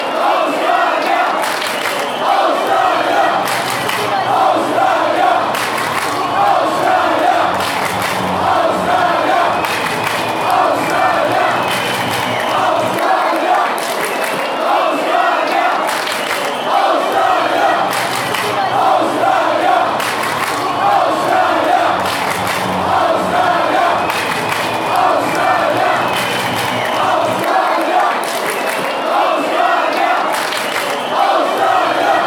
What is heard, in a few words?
australia clapping fanchants